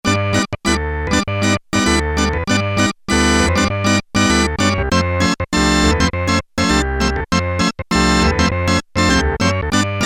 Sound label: 1960's Organ; Sequential Circuits Prophet-5 (Ver.3); Factory Patch; File 2 Programs